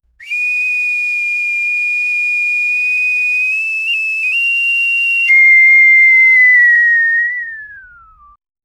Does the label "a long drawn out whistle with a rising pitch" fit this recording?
Yes